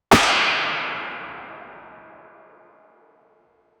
baseball bat hit on dense

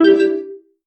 ui sound for the move

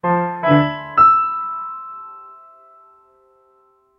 end of game effect played, piano

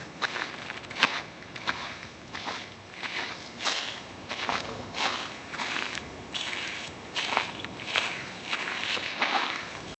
a soft low pitched sound

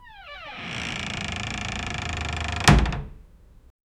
cozy creak of an oak

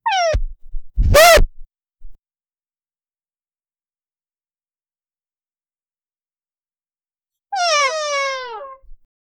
funny creaking creeeak; uh-oh

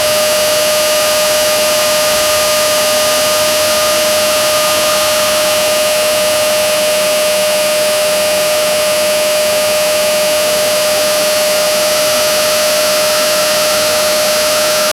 continuous vacuum cleaner; cleaning

